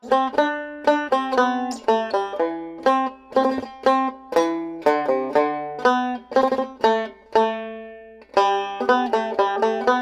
Guitar; Assassin's Creed Syndicate

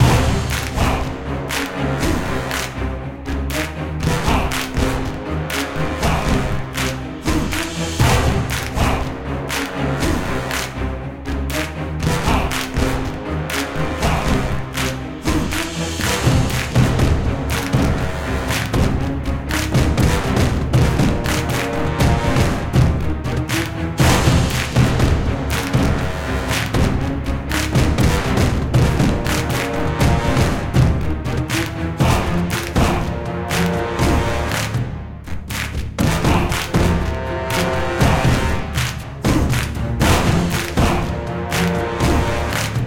Combat; The Ice Warriors

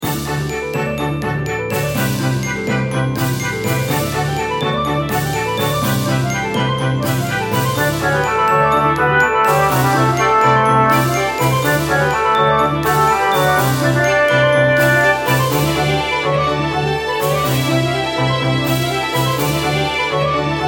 Bgm Fantasy; rpg maker mv

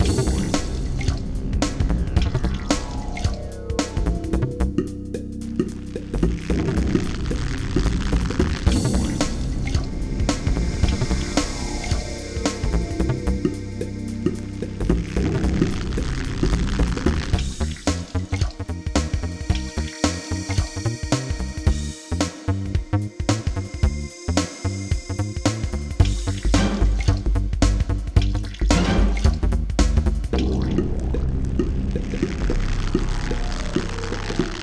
Win Game; Cyberdillo; 3do

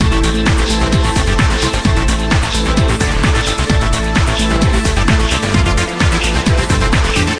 Techno; Learn to Fly 2

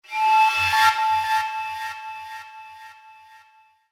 panflute

